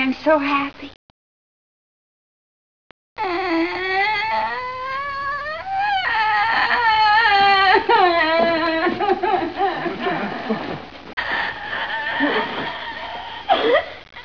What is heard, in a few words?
monk crying